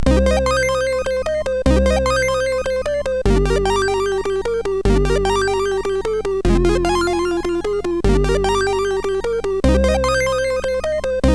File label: MOS6581R4, sid; Dream; hvsc